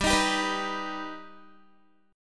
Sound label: G Augmented G down Guitar; strummed; 4E,4A,5D,5G,5C,6F